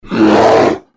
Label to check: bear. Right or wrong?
Right